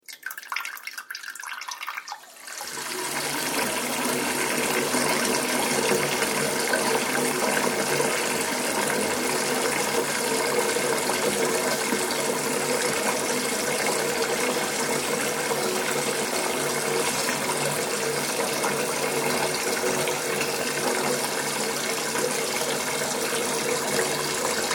Water Continue To Fill Sink, bathroom; Water Sound Effect - Splish, Splash, Splosh!; Andrew Fleming

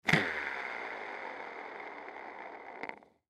Suitcase Wheel Spin, Suitcase Wheel Spin Fast; Spinning Sound Effects; Foley; Objects; Blastwave

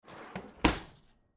Silverware Drawer Close Hard; Drawers; Household; Blastwave

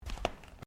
FOOTSTEPS THONGS (FLIPFLOPS) CARPET STEP; Footstep Foley; Footsteps (Sound Effects:Human)

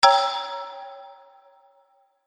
Xylophone Horror Chord Strike

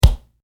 Impact Hit Solid Smack; Punches and Hits; Combat

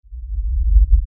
Lfe Rumble Short Reverse Swell; Production Element Imaging Element Accent Transition

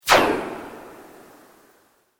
Sweep Organic Fast

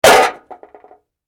Impact Metal Bang; Materials

